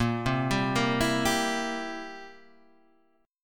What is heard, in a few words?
C 7th A sharp